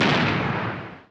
explosion; Bomberman

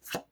card draw

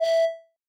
organ E4

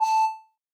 organ A4

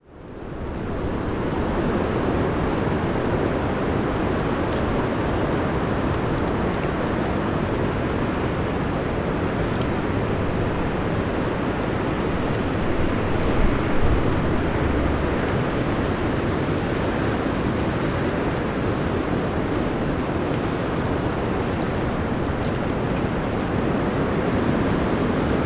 storm

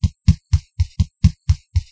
Walk Loop; boredom.

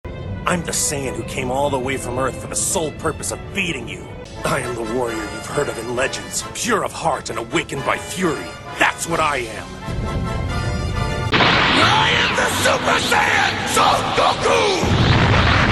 intro long; goku; TinyGame2

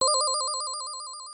insert coin; Bubble Bobble Remake

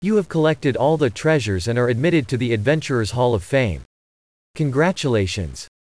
victory

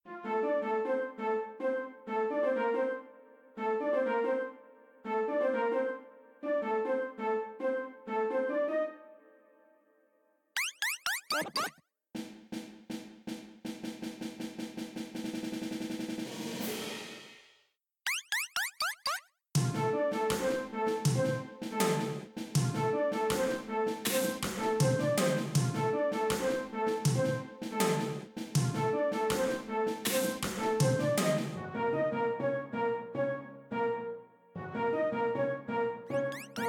boss, bgm; boss buttermilk; Buttermilk Mod

